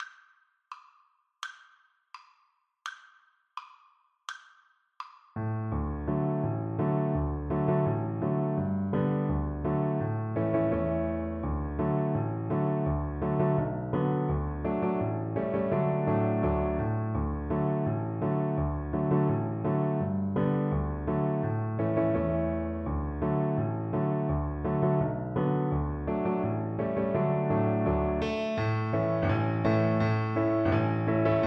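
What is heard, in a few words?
VLC karaoke, Cello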